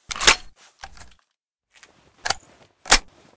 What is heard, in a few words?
toz34 reload, weapons